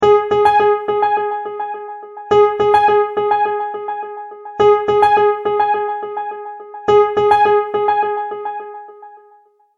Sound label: Multimedia Tone-Thinking/Processing; Sound Design; McKinney Sound